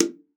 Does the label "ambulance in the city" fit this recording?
No